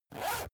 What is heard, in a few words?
zipper use quick